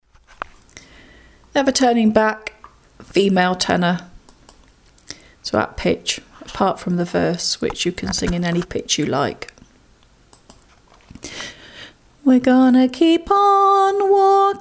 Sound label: tenor female